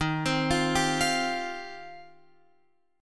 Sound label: Eb 6th Add 9th, Guitar, Open F; arpeggiated; x,10,12,12,10,12